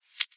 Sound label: Action - Closing Effect Sound; Sound Ex Machina